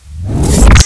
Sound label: Fire